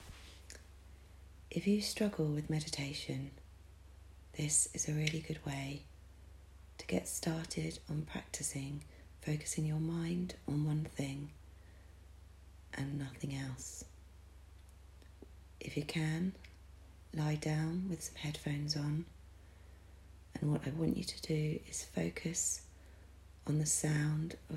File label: Singing Bowl